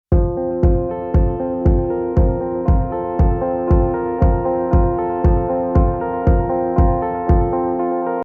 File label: Instrumental